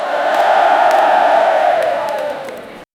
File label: crowd at concert chant